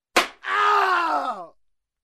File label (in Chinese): 音效 打人